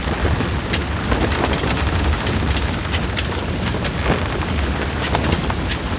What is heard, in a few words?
small player boat; DAoC